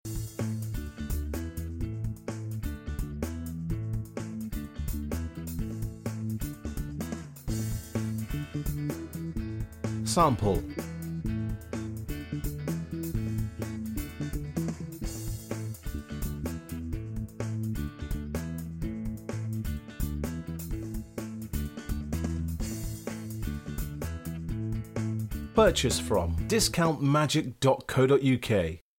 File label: Upbeat Groove